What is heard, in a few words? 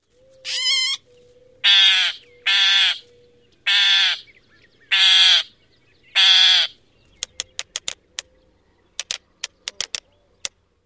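calls and clacks, bird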